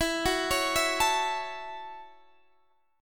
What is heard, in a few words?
F sharp Minor 7th